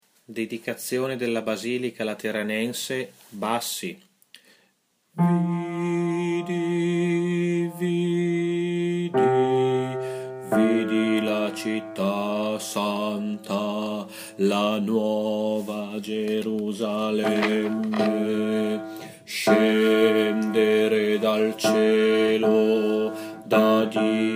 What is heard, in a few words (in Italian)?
Bassi; Dedicazione Basilica